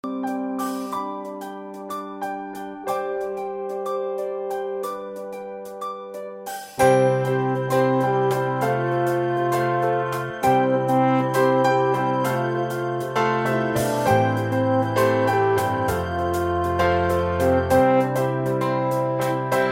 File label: Audition Accomp